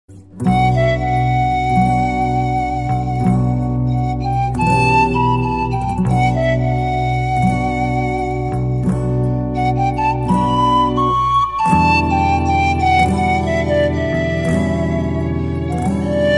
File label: flute